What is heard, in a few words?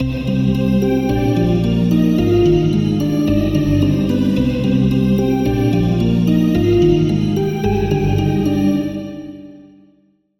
Key; Routed Sound; Serum 2 Expansion - Story Walker Vol.1